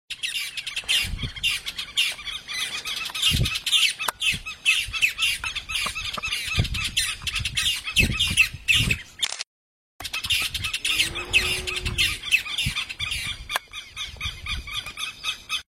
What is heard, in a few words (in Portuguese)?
Canto do casaca de couro do sertao